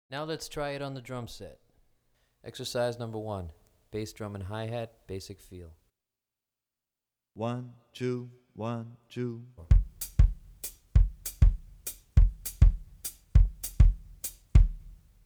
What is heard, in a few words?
Baiao Patterns on Drumset; Brazilian Rhythm For Drumset; DaFonseca, Weiner